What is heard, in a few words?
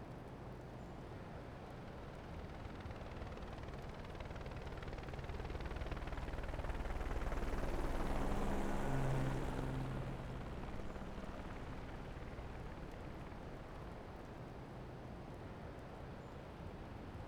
bg cache; ui